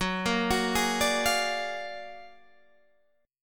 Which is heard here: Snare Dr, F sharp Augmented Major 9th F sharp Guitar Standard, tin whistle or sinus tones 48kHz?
F sharp Augmented Major 9th F sharp Guitar Standard